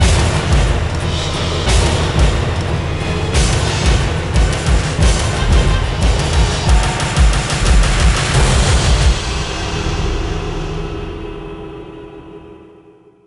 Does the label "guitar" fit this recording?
No